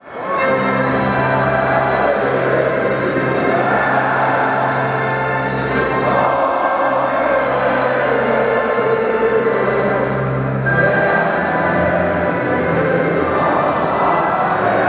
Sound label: denmark chants